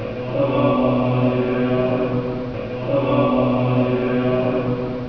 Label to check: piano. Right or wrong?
Wrong